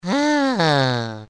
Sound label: sigh; luigi